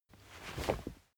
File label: camping chair rise fast